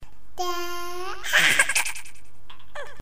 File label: Scary Baby